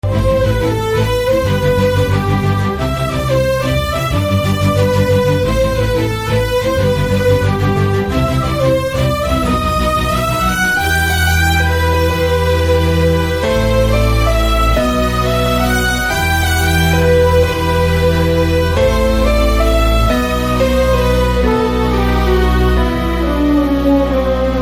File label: Prelude BG Ringtone